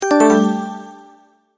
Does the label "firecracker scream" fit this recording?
No